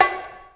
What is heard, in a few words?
BONGO HALL HI